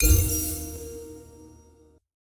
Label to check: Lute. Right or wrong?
Wrong